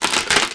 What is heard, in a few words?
Sphinx Door Wood Crack; Playable Tutorial Level